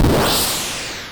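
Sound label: explosion medium, Retro Game Weapons Sound Effects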